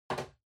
Portable CD Far Put

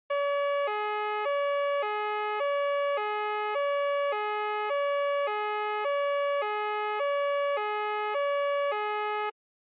Police Car Siren Dry

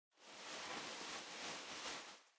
Packaging Air Cushion Far Rustle; 梱包用エアークッション; 遠い ガサガサ; 梱包材

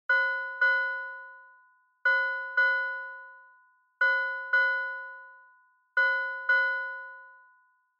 Fire Truck Siren Fake Bell

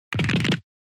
Motion Fracture

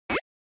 Retro Anime System Low

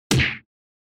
Hit Punch Dry

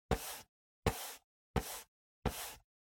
PC Mouse Move Soft Pad Hit

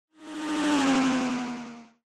Racing Pass By; SNES

